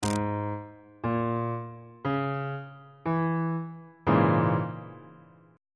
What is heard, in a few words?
d flat minor 6th 2nd inversion on bass clef